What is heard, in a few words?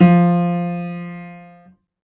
Piano, Harmonic